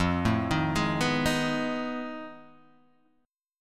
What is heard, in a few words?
F 7th Sharp 9th Flat 5th, Guitar, DADGAD